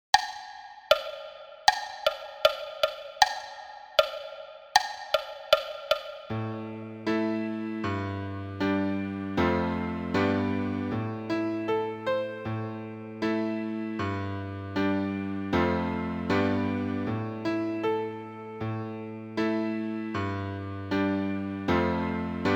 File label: count off; Celtic Adventurer